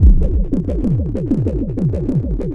Synth Bass; Bass X

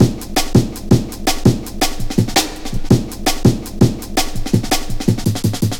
Pride, Drum Loops, 165.5, Jungle; Zero-G Jungle Warfare 1, 2 & 3

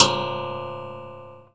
FRIDGERA, PERCMETALIC; Rarefaction; Poke in The Ear With A Sharp Stick

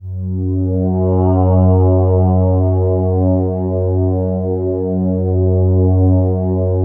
DENSE FL, PADS; Masterbits; Vintage Voltage

